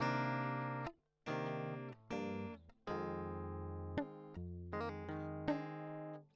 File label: backing track